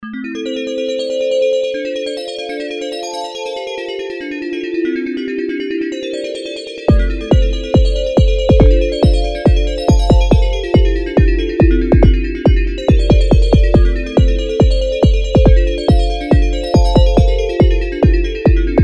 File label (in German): Glockenkrieg